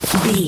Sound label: footstep snow walk